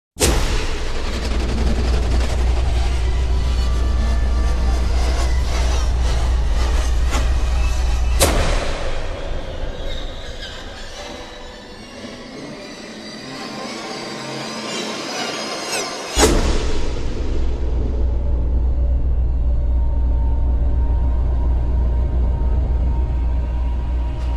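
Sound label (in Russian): Страшные звуки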